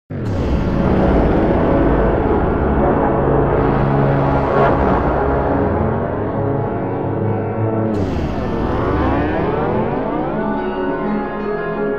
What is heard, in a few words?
vault hallway; Half Life Alyx; music